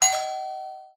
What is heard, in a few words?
Doorbell